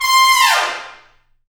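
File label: BRS TP FAL, Brass Trumpets FX, Trumpets Falls; Tps Falls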